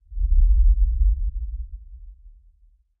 intro rumble; smite; god geb skin7

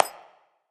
clap; smite; poseidon

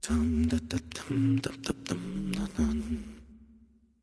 grumble; smite; ram

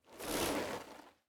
armor; Smite; god Kuzenbo

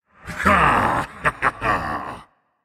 "yay"; smite; voicepack; set

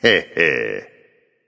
heh heh; smite; anhur

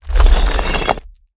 spawn dirt; Smite; Cabrakan

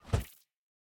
staff spin; smite; ahpuch skin10

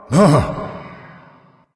surprise; smite; voicepack; khepri

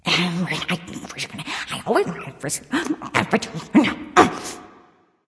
grumbling; smite; aphrodite

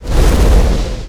slither; smite; kukulkan

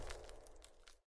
defeat reaction hit ground; smite; god odin

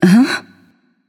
confused; smite; nuwa

